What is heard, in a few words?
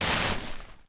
plastic bag full clothes pick up